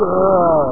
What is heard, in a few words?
APPEAR; Zombies